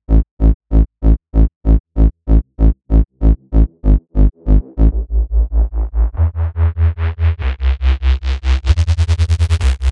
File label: DNB BASS